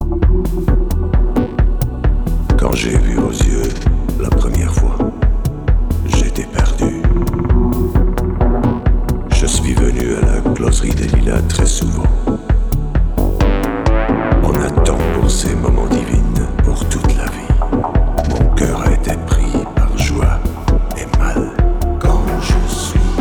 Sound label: hammond